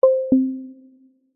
multimedia alert prompt feedback tone simple sine chime 2 tone